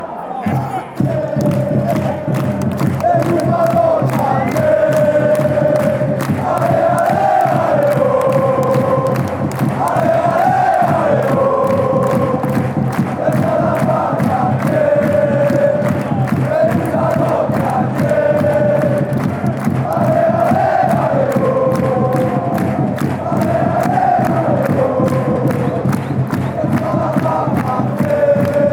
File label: allez allez alleo, chants; standart